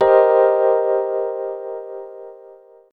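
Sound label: Rhodeo C minor, Drum & Bass Pads; Total Drum&Bass; 90 s Sample CDs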